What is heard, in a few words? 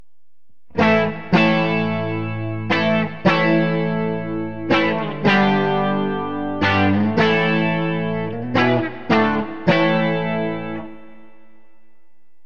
bridge clean; brobucker; burny